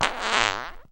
fart short

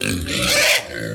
pig; Animals

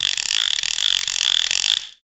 creak

